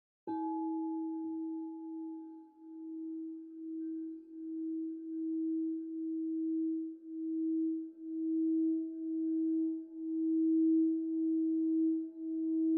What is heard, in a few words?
Crystal Bowl Meditation; thecrystalhealer